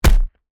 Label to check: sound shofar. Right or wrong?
Wrong